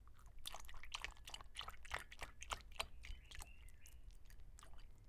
Pouring Milk Into Cereal

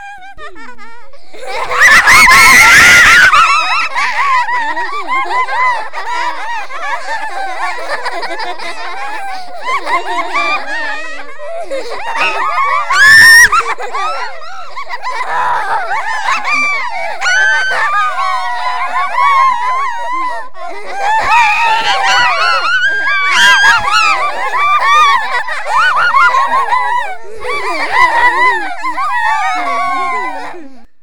Girls Laughing

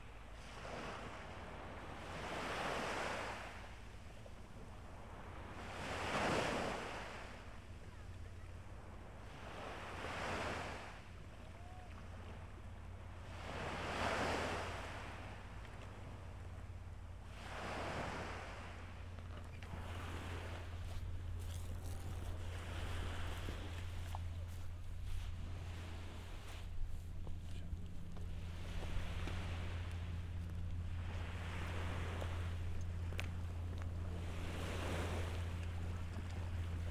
Winter beach

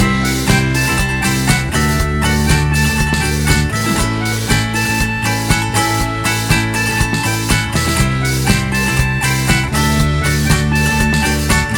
Upbeat

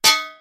heavy bullet ping

